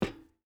footstep boots metal run; Rust

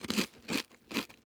eat; Rust

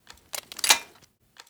waterpipe shotgun close pipe; Rust

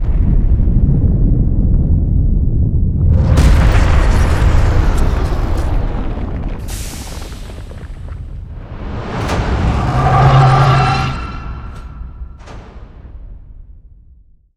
speaker mohole earthquake emerge

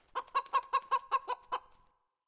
chicken; Civ4 warlords; City Ancient Small

